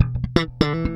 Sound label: Slap, Bass; Bass X